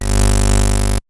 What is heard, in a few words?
Radio Hit Digital Disturbance; Transitional Elements; 1001 Sound Effects